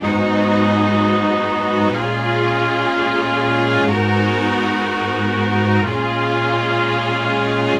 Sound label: strings 123 G#, vocal house; PS-28 Vocal House Samples